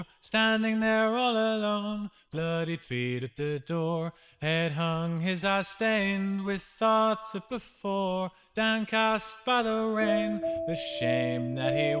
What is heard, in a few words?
vocal; sgmse